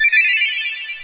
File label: level